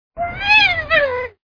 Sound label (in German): klingelton